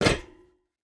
footstep rubber boots metal jump land; Rust

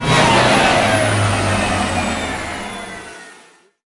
Abrams Engine Acc End; DCS

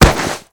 bodyfall dirt

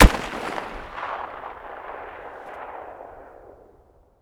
rem700 outdoor far; Escape from Tarkov

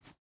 footstep boots sand jump start; Rust

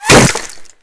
impact masonry; Modern Warfare

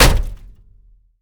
hit, damage; DCS

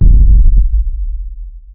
explosion rumble; War Thunder

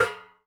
phys impact metal thin hollow; Rust

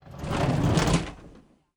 Aircrafts Spitfire LF Mk IX Canopy Open; DCS

